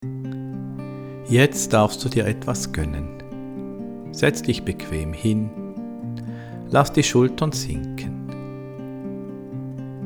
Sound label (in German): Atemuebung Musik